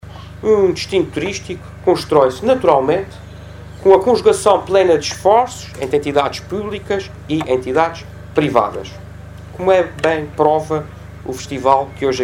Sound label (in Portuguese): Angra Jazz